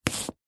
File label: apple chop slice with large knife